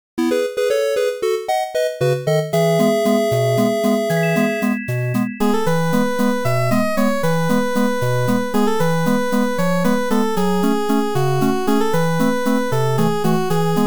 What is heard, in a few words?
waltz; clono